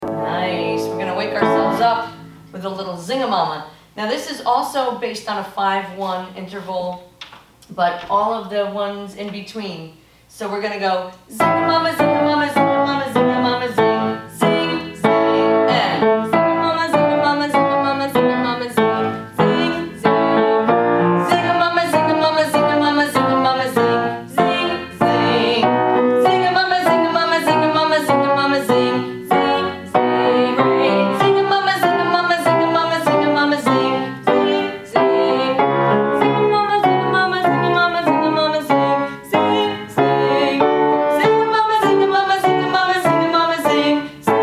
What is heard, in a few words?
Vocal Exercise